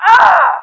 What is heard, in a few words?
pain; action-quake2; actionrally